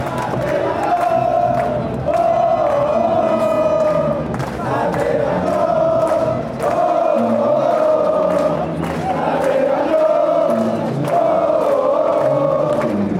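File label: chivas chant, football songs and soccer chants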